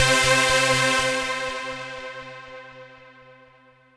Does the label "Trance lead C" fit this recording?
Yes